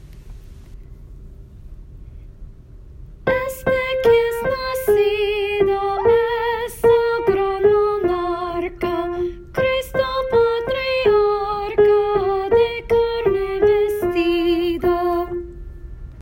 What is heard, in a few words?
solo